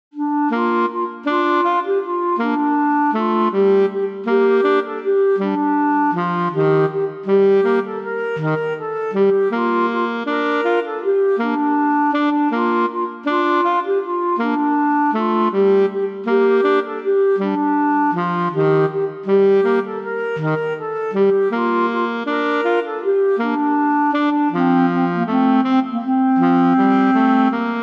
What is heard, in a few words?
Duet, Clarinet Saxophone